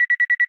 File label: jet lock; superbwarfare; a10